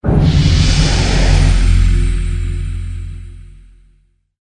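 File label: Level Up sms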